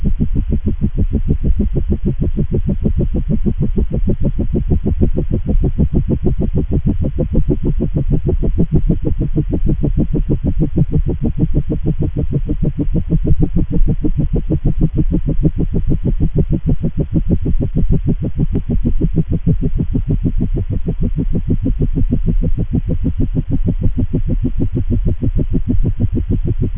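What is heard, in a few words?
helicopter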